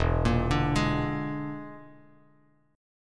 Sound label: E 7th Flat 5th, down, Bass Standard; E7b5 arpeggiated; JGuitar